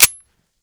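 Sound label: slide release, deagle; cof; guns beretta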